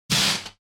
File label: lightsword lightsword clash; soundscrate